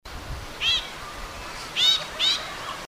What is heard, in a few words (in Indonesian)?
suara kekep babi pendek